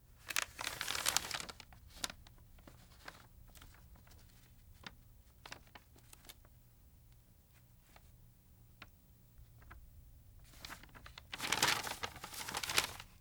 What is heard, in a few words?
Map Handle; Fairlight Sound Lib